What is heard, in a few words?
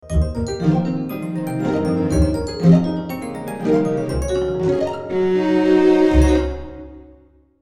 Musical Composition, Musical Composition Twinkle Orchestral Melody Low; Blastwave